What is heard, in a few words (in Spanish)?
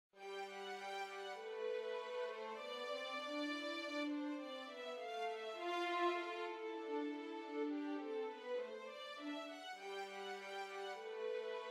2 violines; duo violin; nivel dificultad medio